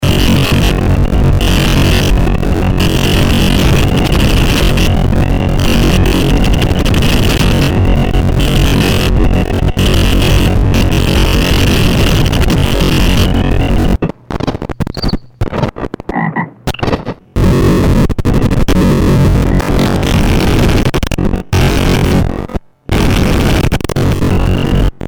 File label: CD player, Circuit Bent